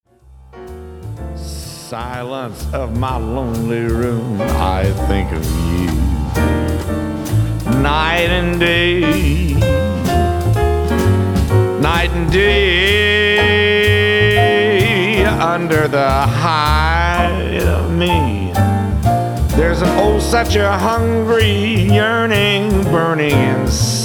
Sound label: jazz bars